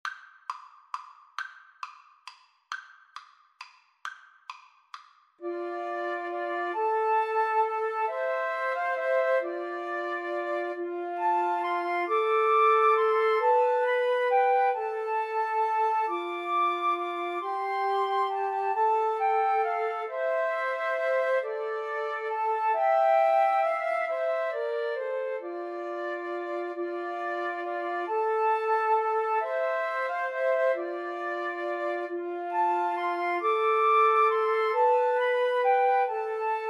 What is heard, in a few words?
Flute Trio